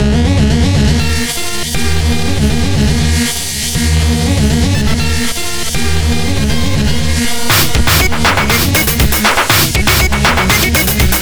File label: Synthopop